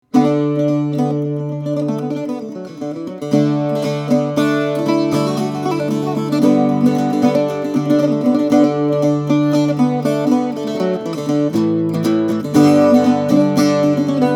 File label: octave mandolin; mendel; bouzoukis